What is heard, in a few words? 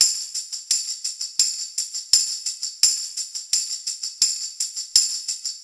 085 Hip Hop Tambourine, Percussion; Digidesign; Pro Tools; Pro Tools Essential Loops